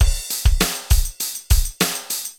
ROCKS, ROCK POP DRUM; MAGIX

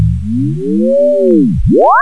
FOURIER

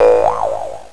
BOING

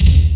TRUNKRVB; PERC; cubase

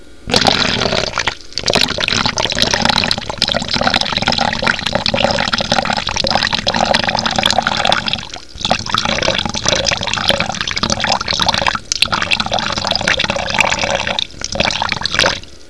POURING

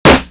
GUNSHOT

